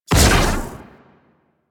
Pulse Laser Shot; Railjack

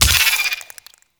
Infested Burst Rifle Headshot, weapons

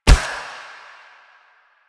Choir Bow Quick Fire; Warframe; Weapons

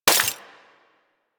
Bullet Impact Debris Glass; Warframe; Weapons

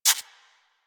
Rodent Step Dirt, Forest Rodent Footsteps; Characters; Foley; Warframe

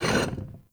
Vase Medium Slide; Objects; Vases

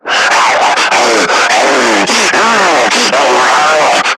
Screamer Idle; Warframe; Lasrian

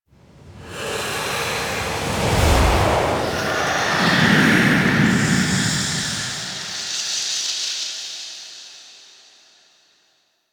Cargo Hold Merge; Call Of The Tempestarii; Wraith Quest

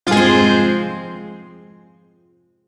Shawzin Phrygian Chord; Warframe; Duviri Shawzin

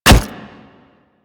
Sentient Brachiolyst Land; Warframe; Sentients

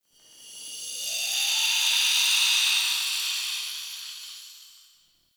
Distant Wraith Whisper; Ambience; Mendicant Area

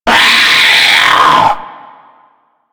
Zombie Attack, Vocals; Mariner Zombie

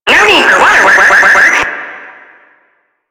Tentacle Swing

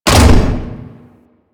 Galleon Barrel Drop; Ambience; Props; Warframe; Grineer Galleon

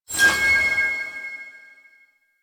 Shard Ephemera Show, UI; Warframe; Archon Shard

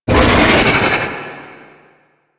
Attack Vocals; Golden Maw; Warframe; Ambience; The War Within

